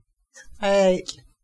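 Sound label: river otter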